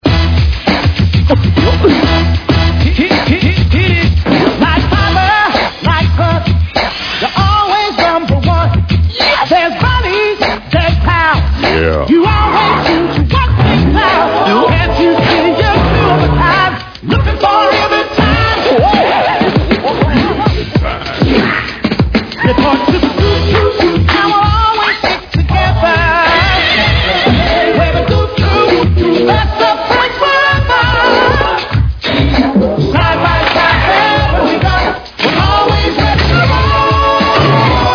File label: ambience